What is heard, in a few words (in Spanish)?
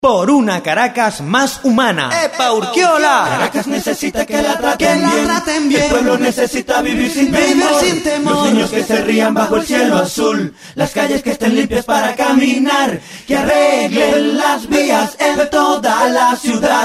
jingle Solo Voces, jingle cantado a capela; Caracas necesita solo voces; jingles cantados